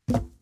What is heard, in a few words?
wood die